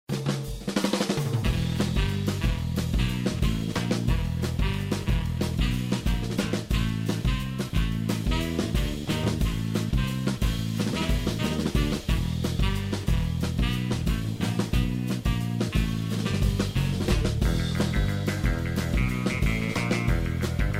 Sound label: Music intruder alert; Team Fortress 2